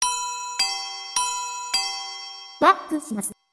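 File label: back buzzer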